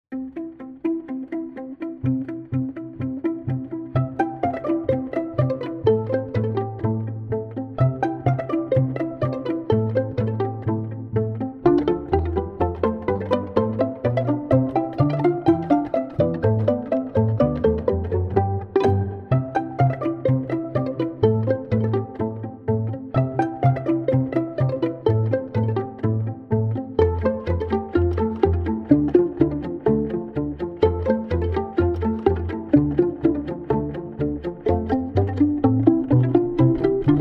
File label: Pizzicato Dance mix